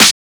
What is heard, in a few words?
Snare, techno drum shot